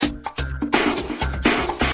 weird intro, drums

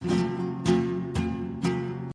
Flamenco, guitars

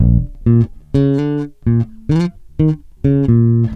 funk, bass

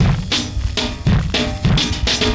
brush loop, drums; Loopasonic

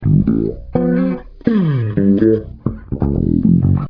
peww, bass

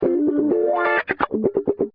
swell, guitars

